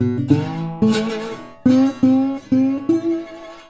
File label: Blues Guitar Samples; ILIO - Fretworks